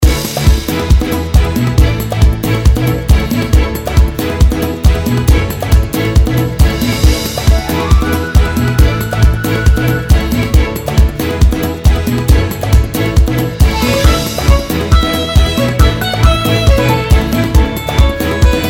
Raid; Mist Train Girls

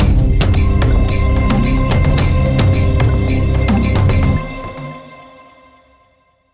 basicMood Cueva Bosque

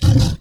Spit; blastmorph pinball; xbox series xs

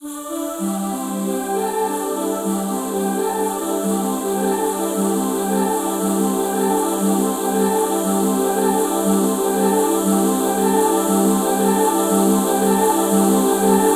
Long Melody; Comix

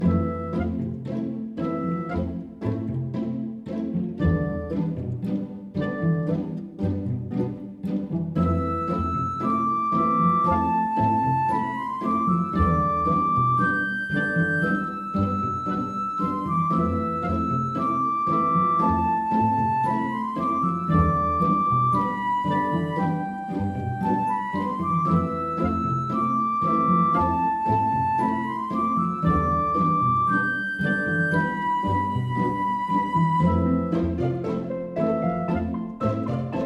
Raining Day; Ezaron Chronicles

